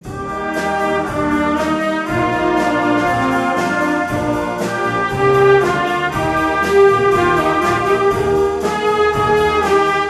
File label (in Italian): STUDIO PER PIANO